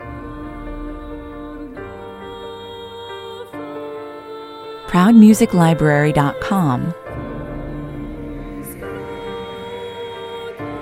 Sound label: with choir and solo voice relaxed